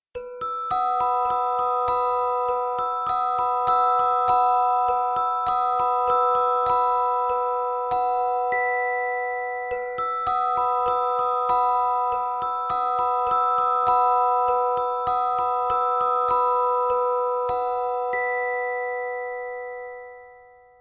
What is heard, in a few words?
elegant bells